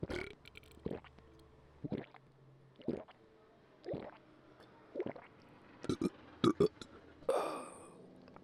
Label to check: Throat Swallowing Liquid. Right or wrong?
Right